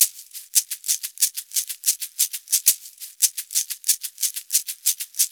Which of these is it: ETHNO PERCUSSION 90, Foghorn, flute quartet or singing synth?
ETHNO PERCUSSION 90